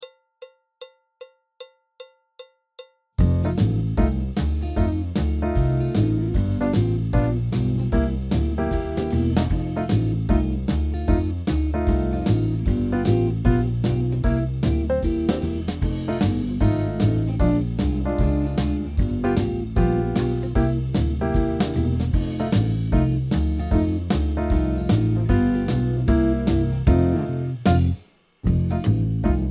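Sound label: backing track, Jazz; alto, tenor, trombone, piano; Jazz Syllabus Level 2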